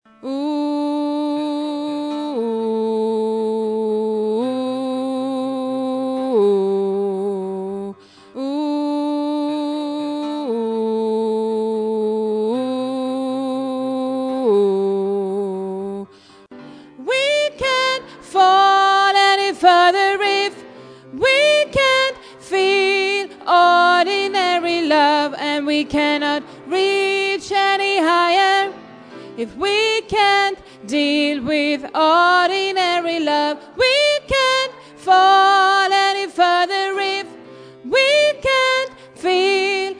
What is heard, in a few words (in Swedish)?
bas, stammor